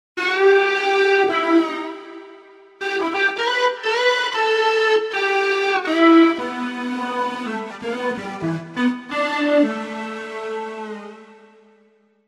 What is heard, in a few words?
Air Lead; TURBO VL